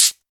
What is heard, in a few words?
sharp hihat sample a sharp key, closed hi hat sound tuned to the A# note